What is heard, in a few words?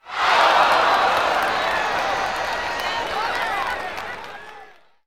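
Basketball Crowd Reaction; Crowds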